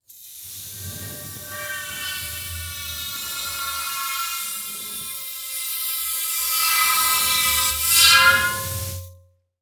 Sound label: Horror Scary Wind Through Pipe Creepy